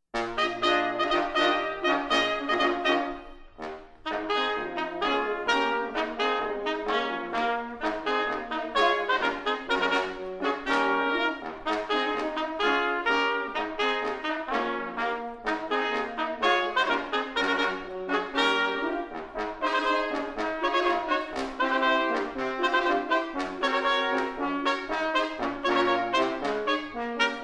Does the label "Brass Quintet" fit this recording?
Yes